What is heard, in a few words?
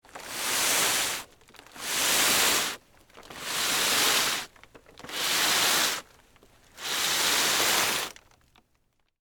Body Drag Tarp, Wrapped, In, Plastic, Several, Movements; Horror; Blood And Guts; BlastWave